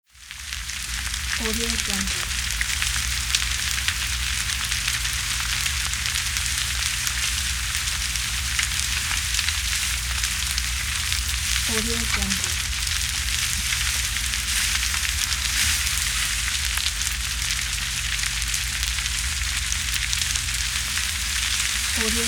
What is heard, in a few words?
fire loop; elevaudio